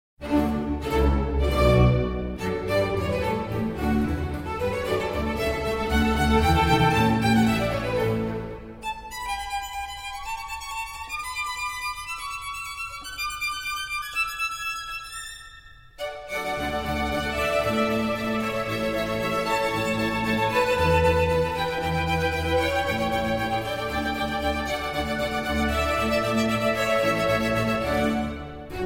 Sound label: Concerto in E-Flat Major for Violin and Strings